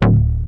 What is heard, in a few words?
Arp B D1, ARP Odyssey; synth; musicradar-synth-samples